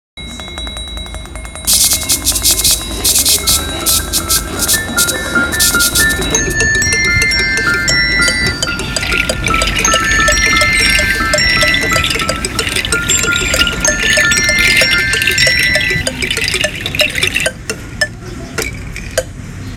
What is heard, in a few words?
Rain